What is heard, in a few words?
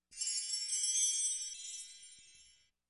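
chime; Перезвон колокольчиков создаёт волшебные звуки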